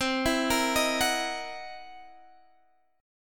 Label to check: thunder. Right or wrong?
Wrong